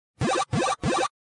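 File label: weird pipe sound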